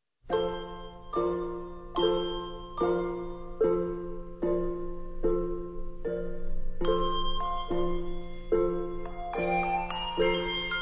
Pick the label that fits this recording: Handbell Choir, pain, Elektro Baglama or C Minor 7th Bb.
Handbell Choir